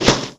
Safe Holes

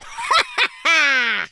laugh, Super Hero; speech

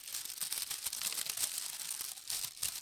Rustling Leaves

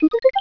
invite chat; host; Game Voice; HawkChrome

